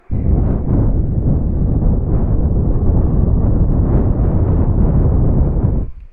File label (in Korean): 바람